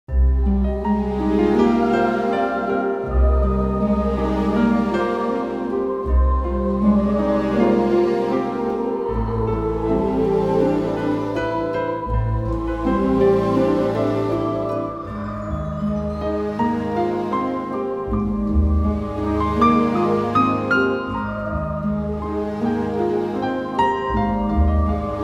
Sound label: Town Ambient